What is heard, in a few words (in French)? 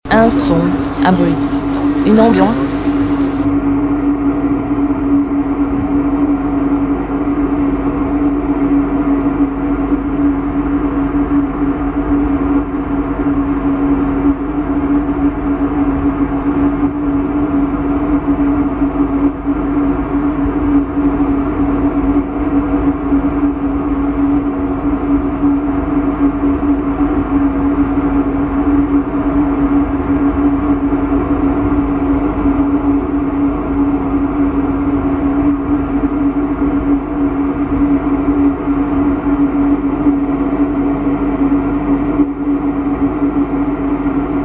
Un son un bruit une ambiance